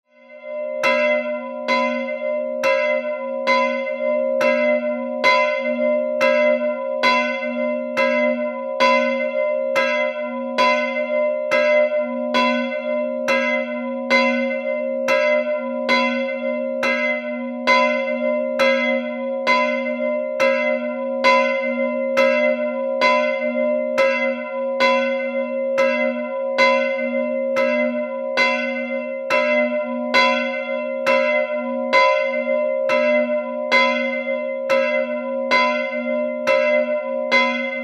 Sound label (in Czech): zvon vyzvánění